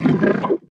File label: stomper death, enemies; Factorio